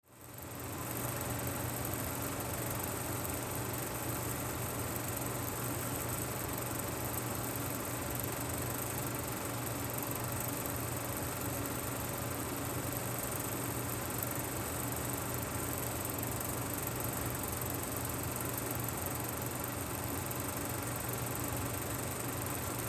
Film Projector 35mm Large Movie Format Distant; Projectors; Technology

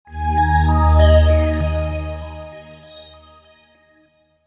Startup, Computer: Short Musical Style Phrase; Miscellaneous Musical; Stuart Duffield

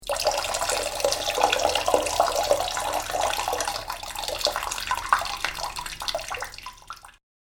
Urination Sounds; Urinating Sound Effects; human sound effect; Andrew Fleming

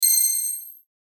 Hit High Ring; sound design; Blastwave

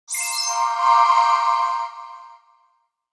Hit Marimba Chime Swell; Production Element Imaging Element Accent Transition; Mallet; 5.1 STEREO MIX; Blastwave

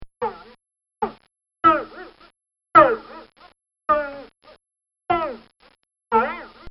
Cartoon Sound Effects Boings; Cartoon Sound Design

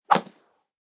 Bamboo rod swings through air; Staffs and Rods (Sound Effects:Combat)

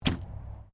Archery Hunting Bow Firing; Various archery sounds; Miscellaneous Sports

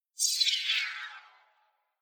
Metal Shimmer Whoosh Sweep High Pitch Reverse; Sound Design Title Sfx; Tom Hutchings

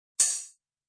High Hat Open; Musical

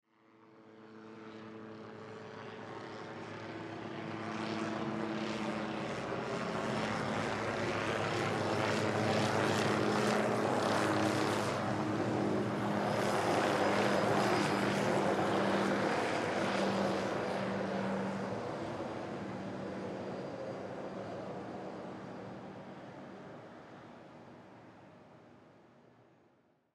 Helicopter Fly By; Transportation Aircraft; Helicopters; Vehicles

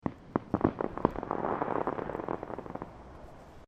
Fireworks Fountain; Backgrounds; Crafted Signals

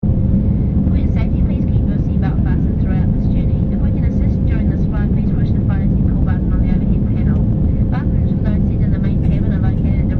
Airplane Ambience; Airplanes; Vehicles; Partners In Rhyme

